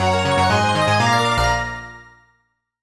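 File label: Jingle Congratulations; Shoukou Shindan Training DS; NDS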